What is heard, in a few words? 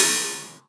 Ride, Cymbals; Vengeance Samples